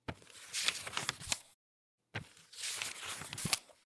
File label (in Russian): listanie stranic